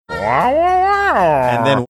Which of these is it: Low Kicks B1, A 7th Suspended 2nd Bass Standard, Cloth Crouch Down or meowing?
meowing